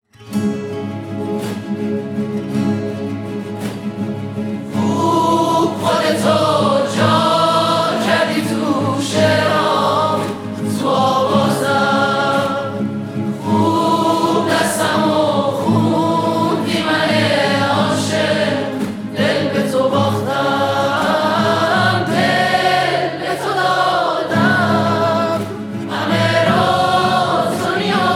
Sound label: Guitar Version